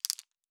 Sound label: Objects Small, Fantasy Interface Sounds